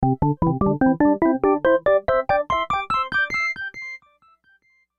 Organ Delay Major, Organ Delay Major Ascend; Cartoon; Musical; Cartoons; Blastwave

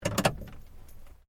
Car Toyota Starlet door open; Toyota hire car in Japan; Cars; Vehicles

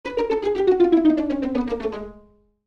String Plucks, String Plucks Descend; Fast Musical; Cartoon Music; Cartoons; Blastwave

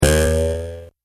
Arcade Game Springboard; Video Game Sound Effects; Retro Inspired Sound Effects - Think Nintendo, Atari, C64

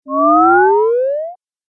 Game Fx Growing Sound, Video Game Sound Effects

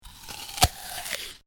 Eating Bite Munch Crunch Person Bites Into Apple; human sound effects; Lynne Music

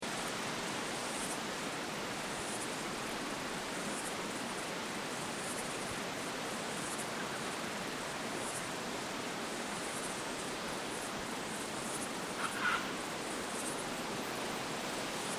Ambience Victoria Wind Insects Birds; Loop; Regional Ambience; Outdoors; SoundEffects-Foundation1

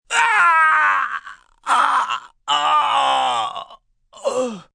Pain Dying, Pain, male, long, dying, agony, moan; human voice; Sound Effects:Voice; VoiceBox

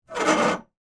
Squeak Turn Metal, Pole; Texture; Squeaks (Sound Effects:Objects); BlastWave

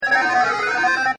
Game SFX, Arcades; Backgrounds

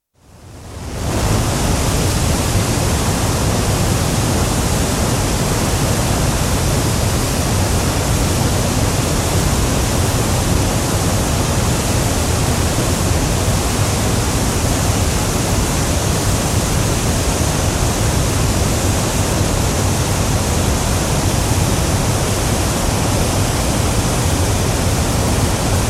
Niagara Falls (Close perspective recording); Water; BBC

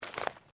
FOOTSTEPS SNEAKERS UNDERBRUSH STEP

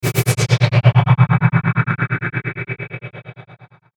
Chopper Static Long Power Up; Blastwave; Chopper Sound Effects

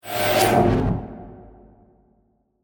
Dimension Portal, Suction, Scifi; Science, Fiction; BlastWave

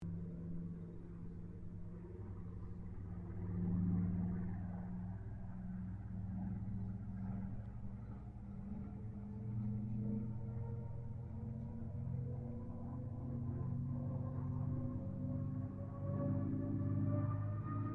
AIRCRAFT CA-13 BOOMERANG PASS STEREO

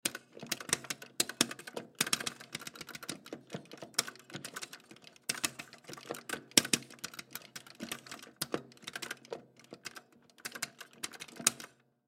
Arcade Game Play Button Joystick Movement; Arcades; Blastwave

